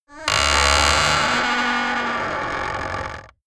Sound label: wood gate open creak heavy